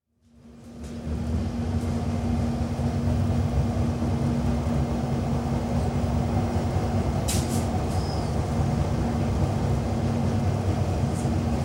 Docklands Light Railway train, interior, constant run with station stops; Train Sound Effects; station stops at 0m52s and 2m13s; BBC